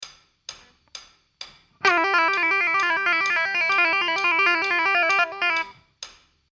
gitar

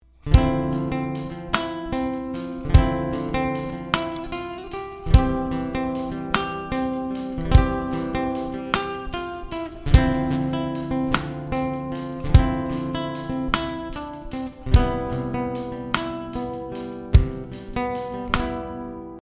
bgm guitar

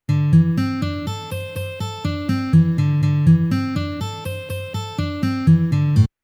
Am penta 3 string, guitar

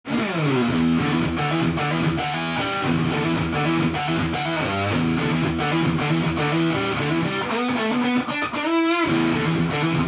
rover fuzz, guitar; fuzzface ufo84 roverfuzz

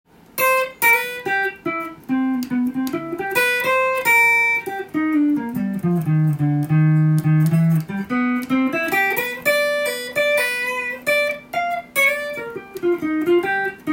pick

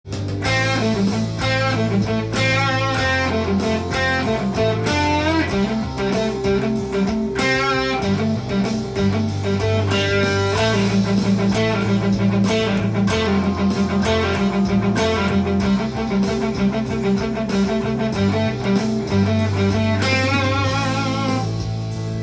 4 tone solo, guitar

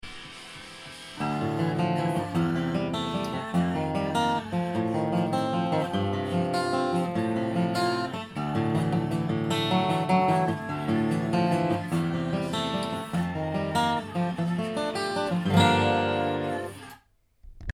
cry baby

